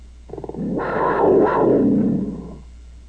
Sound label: blk panther roar